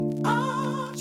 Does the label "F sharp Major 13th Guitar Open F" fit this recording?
No